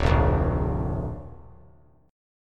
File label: D Minor Sharp 5th D down; Dm#5 strummed; JGuitar